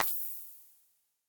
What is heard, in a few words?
heartbeat; osu